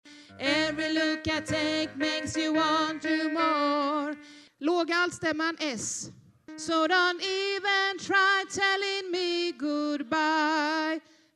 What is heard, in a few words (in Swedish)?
alt, stammor